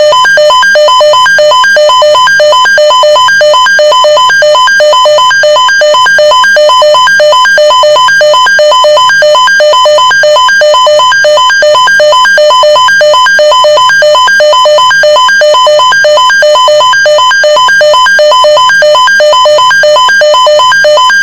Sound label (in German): Schneller Alarm; Alarmton; Alarmtöne